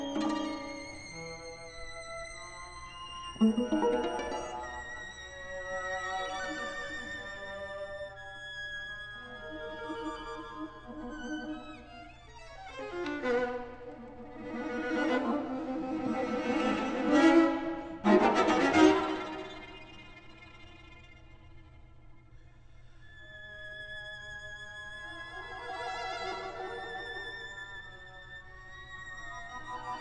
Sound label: classical